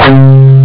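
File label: hard scratch; jazz jackrabbit 1; M8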